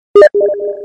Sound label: steam; csgo